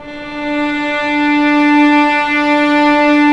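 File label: violins, piano, slow; String Master Series; Vlns 6 p-mf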